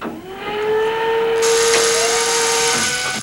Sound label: ASSEMBLE, HYDRAULICS; Northstar; The Wizard VOL-1